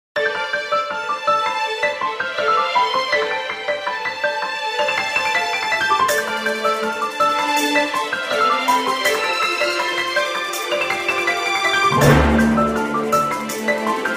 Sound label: Battle BGM